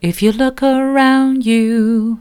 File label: soft, vocal